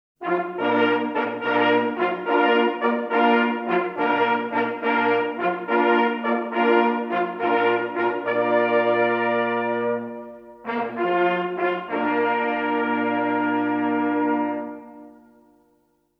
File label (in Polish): zajac na rozkladzie